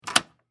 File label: Foley, Door, Lock, Dead, Bolt, Hotel, Door; Door Closing Sound Effects